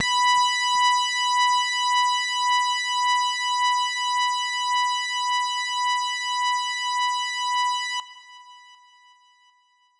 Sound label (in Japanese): 音程 男性 B4